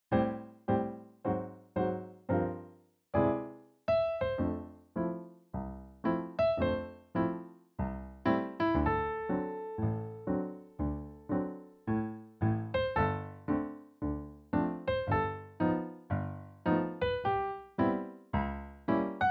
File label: BC 4x4 112bpm, ballet music